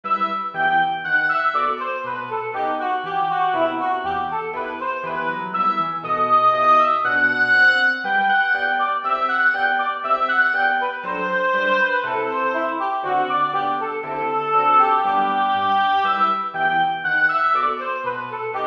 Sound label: Gavotte